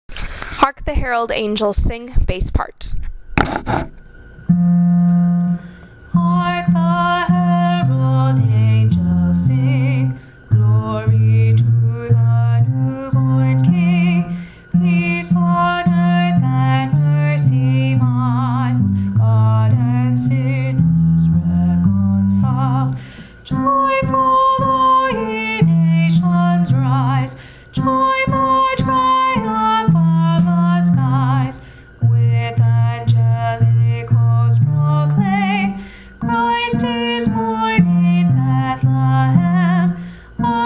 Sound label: hark the herald bass, choir